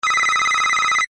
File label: Ring